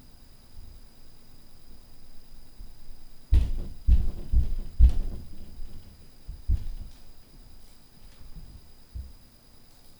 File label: Usual Walk